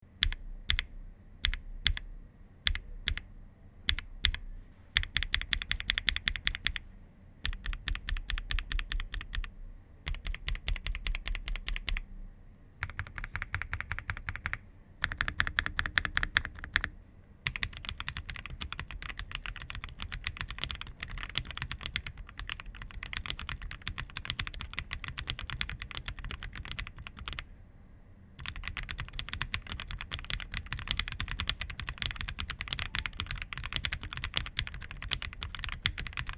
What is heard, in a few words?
LUNAR YELLOW SWITCH SOUND